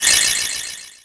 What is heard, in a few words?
Ring Bonus; Finding Nemo; XBOX